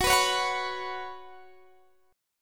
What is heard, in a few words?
F sharp Suspended 4th; F#sus4 strummed; x,21,21,18,19,19; chord shape